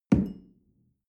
coffee place tablecloth soft

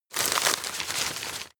rustle medium short; berufe

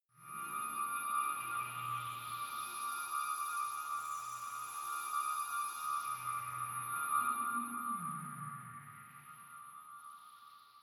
space chiming wind; weltraum

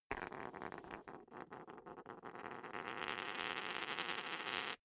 poker chip spin long; soundpool

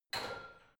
barbell heavy plates lift rack reverby; sport freizeit; fitnessstudio

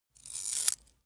small metal scratch; industrie wirtschaft; metall

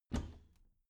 bathroom cabinet doors open regular; objekte; tueren

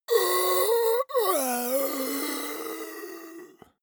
monster die; kreaturen

